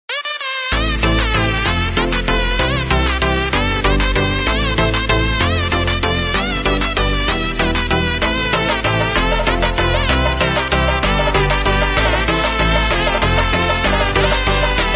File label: ringtone